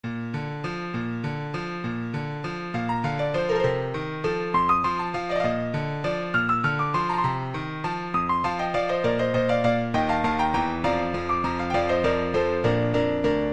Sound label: trombone